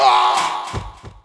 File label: Joker die; Eft